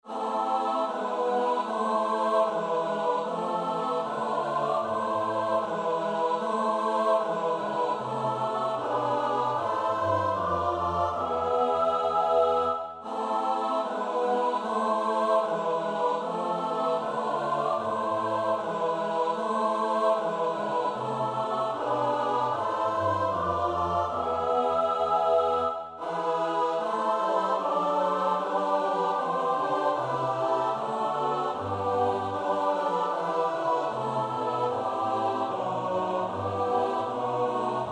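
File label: soprano alto tenor bass; Bach (Johann Sebastiaan); BWV 162; Ach, ich habe schon erblikket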